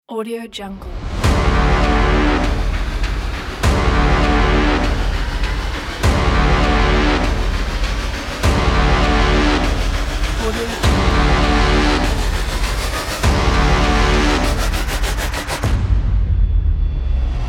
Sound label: countdown epic teaser